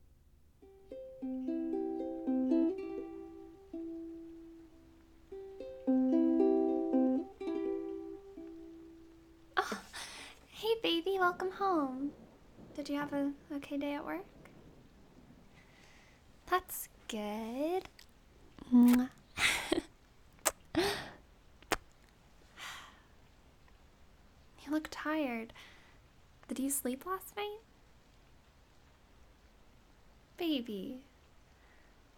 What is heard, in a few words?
rainy ukulele jamming; F4A; Girlfriend Experience; sweet, but not super romantic; no edits except rain